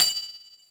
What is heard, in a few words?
grenade launcher casing eject